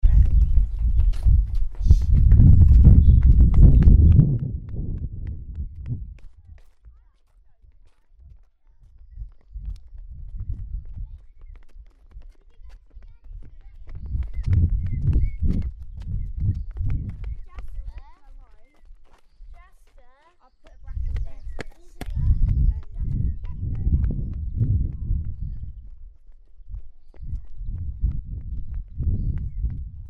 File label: wind, outside playground